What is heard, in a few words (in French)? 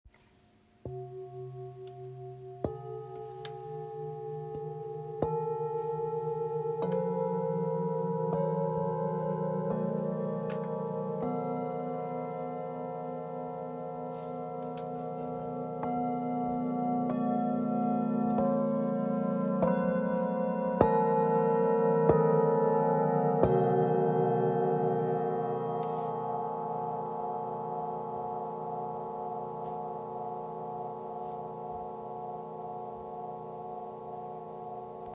Set real full moon, Sets de 7 Bols 7 Châkrâs; Capricorne